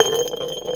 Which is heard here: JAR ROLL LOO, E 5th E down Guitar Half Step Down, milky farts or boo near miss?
JAR ROLL LOO